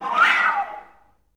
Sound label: FL FX